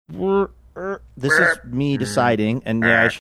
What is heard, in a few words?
making series funny thinking sounds